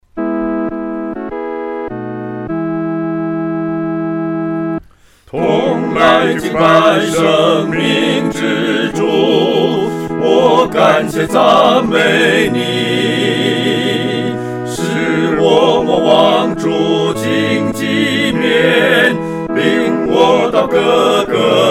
合唱 四声部; 领我到各各他

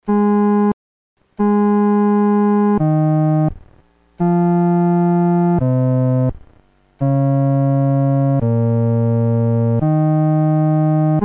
独奏 第四声; 阿门颂（二）

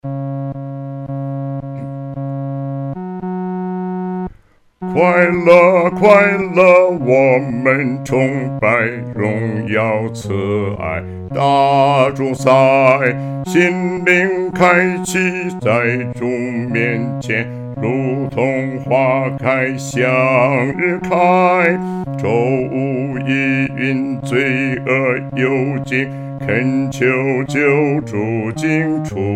独唱 第四声

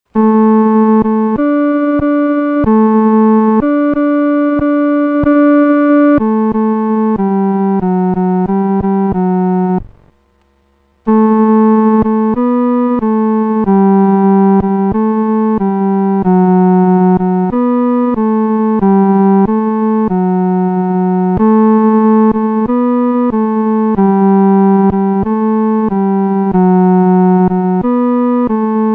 独奏 第三声

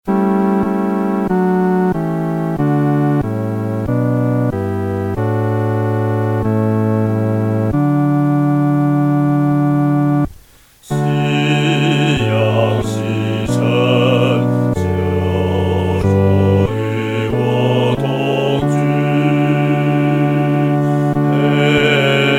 独唱（第四声）

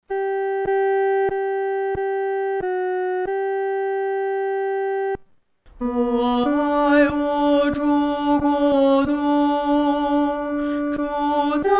独唱（第二声）; 我爱我主国度

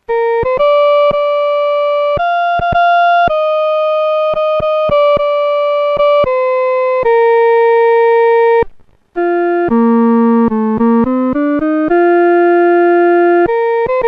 哈利路亚归十架 独奏（第一声）

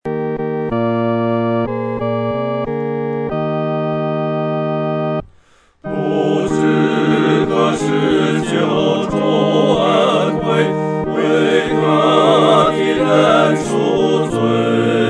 合唱 四声部

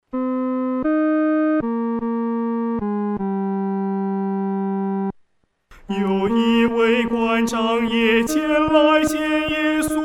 独唱 第三声; 你必须要重生

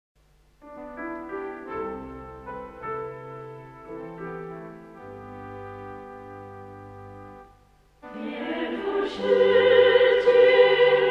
生活美好歌

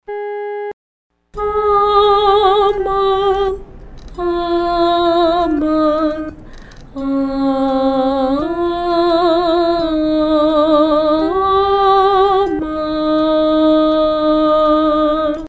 独唱 第二声; 阿门颂（二）

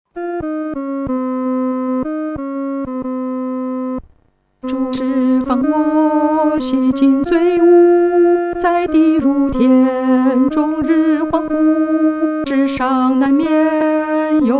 独唱 第二声

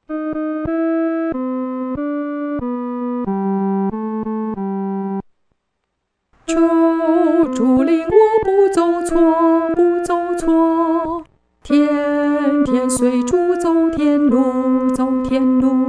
独唱 第二声

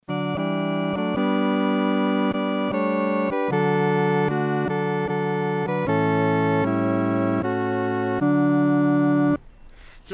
合唱 四声部

